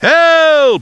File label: huh